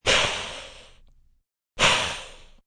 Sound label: Hiss, Animal sounds, cat hiss, or snake (human voice); Cats